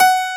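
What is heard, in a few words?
keys, pop piano; Pop Pno + P3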